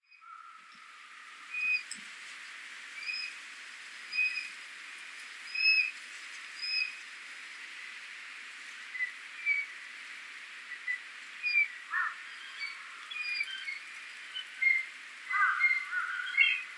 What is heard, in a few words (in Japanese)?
kumataka, ishikawa, koe